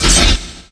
blade hit; lancer weapon fx

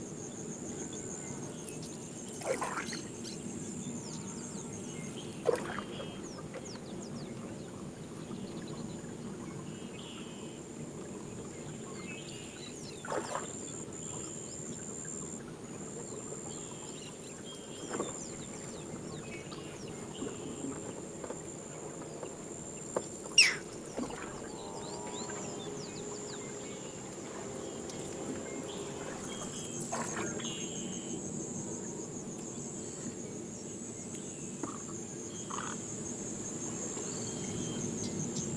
jungle river paddling loop; sonniss gdc

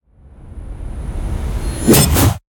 transition; bluezone; sonniss gdc

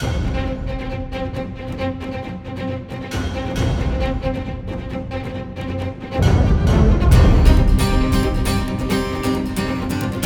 combat; givmaru trading harbor; ivan duch music packs

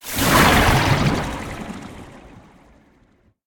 magic spells cast short water general; fvtt sonniss gdc audio

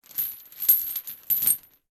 chains metal movement distant short; Sonniss GDC

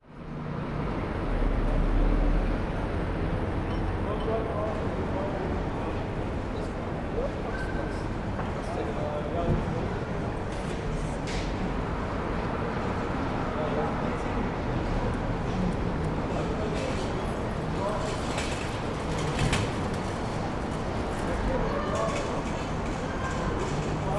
ambience austria vienna ext day side street; sonniss gdc

